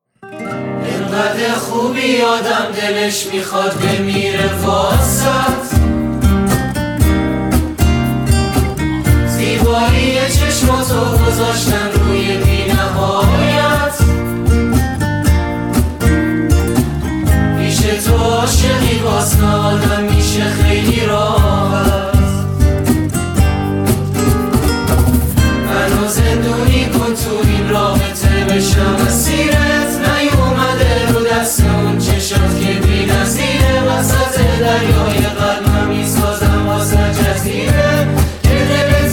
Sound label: (Guitar Version)